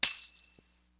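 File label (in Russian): тамбурин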